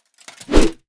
Magician attack; AquilasTemple

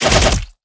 Magic Arrow Hit

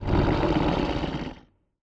crocodile; SwampOfDarkness

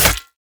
Reaper damaged

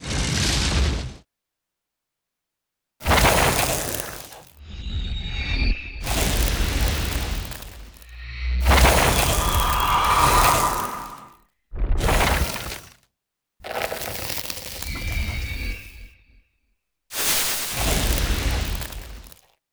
Lake surface env; NixiesLake

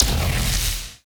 Holy Bolt Hit; Elf

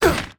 Warrior hit; AquilasTemple

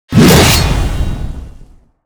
Reaper Attack

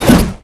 Holy Sweep; Crusader

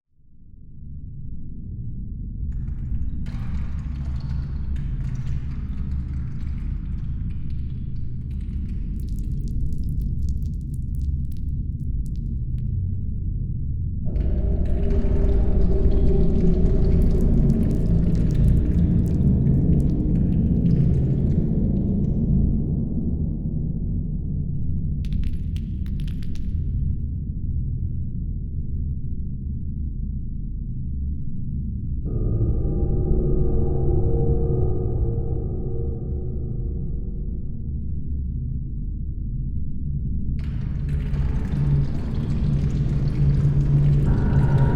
Boss Ambience; BGM; Ferea

